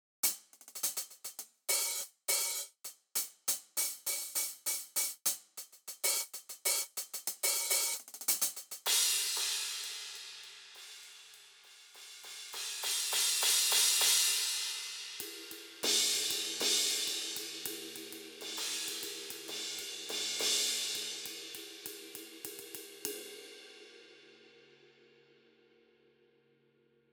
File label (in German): Roland TD-17K-L test becken